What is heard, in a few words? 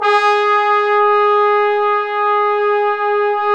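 Bone Sec, trombone section; Roland L-CD702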